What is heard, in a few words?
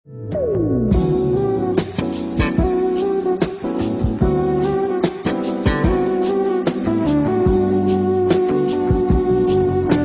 smooth chill out jazz